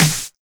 Snares; Vengeance - Essential Clubsounds Vol.4

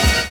ORGANUS; Univers Sons

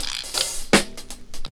REAL 82, DRUM LOOP; Ueberschall; Jam Box

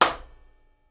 SNARE, Live Snares; PlatinumPhattCD1; E-MU Producer Series Vol. 8 – Platinum Phatt

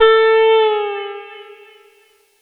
Lead Synth

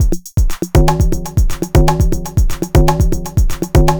NRG Break, Trance, Drums, Breaks; Sonic Foundry (Sony Creative Software); Trance NRG VOL-1

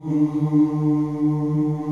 E1 MALE CHOIR, OOS; Northstar - New Gold VOL-2

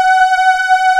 SOFTLY PAD; AKAI S6000 CD-ROM - Volume 1; BrassWind

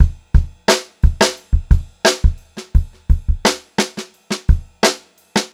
Funk-Groove Drumloops, 87 STRVAR; USB Soundscan vol.38; AKAI

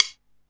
Rimshot, Alesis Hr16, Drums

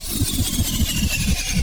sfx sci fi; Masterbits - Switch FX

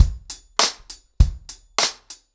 100BPM, ACOUSTIC DRUMS; The Roots Drumloops

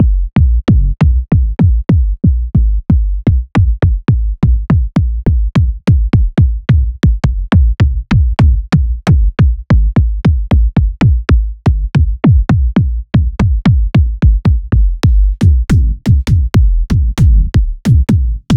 Kicks Synth Short; Ghosthack

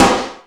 Mega Drums, Drum Machines

